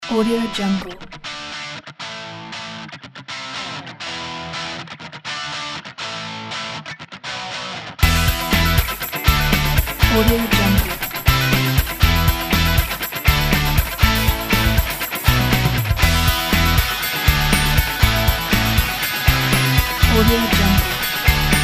positive upbeat rock; musicfactor

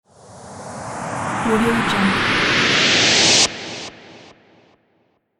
sweep riser white noise

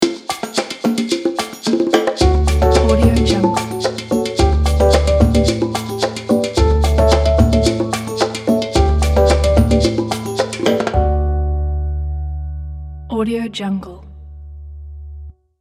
summer ice cream logo; noisehoop

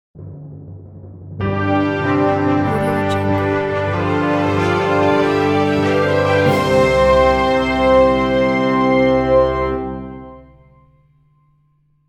cinematic hollywood opening logo

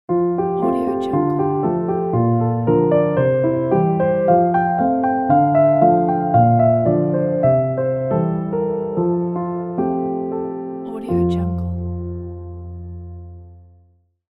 an elegant piano logo

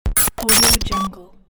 glitch title

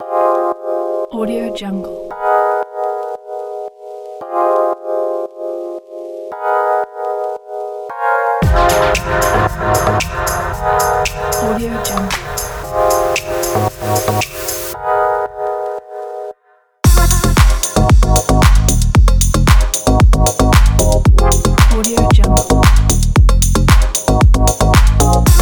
deep house fashion show

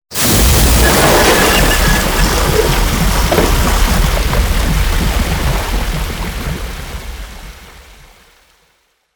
ship sink in water

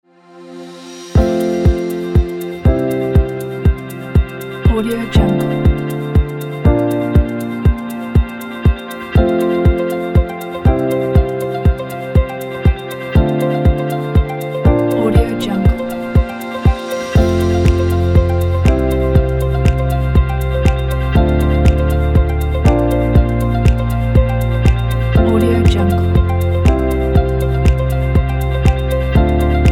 technology corporation background ambient

